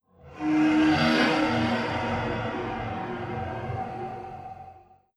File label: Play Stinger